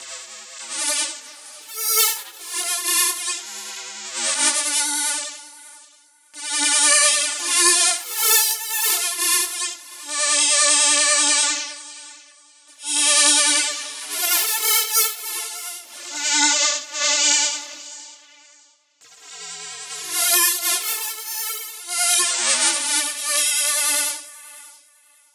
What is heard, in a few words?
Spooky Synth